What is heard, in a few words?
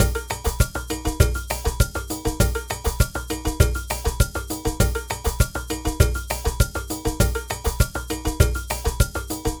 Ensemble, Latin Percussion